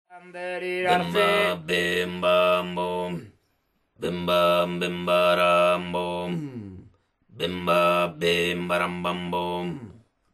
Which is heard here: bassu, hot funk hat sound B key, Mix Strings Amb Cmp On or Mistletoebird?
bassu